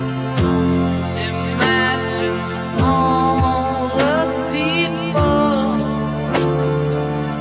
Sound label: Pop vocoder; Phase Vocoder